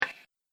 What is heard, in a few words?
Game Piece Slide; Slide Sound Effects; object sound effects